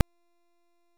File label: Proto Infested Mutalist Big Jump Scream; Warframe; Abilities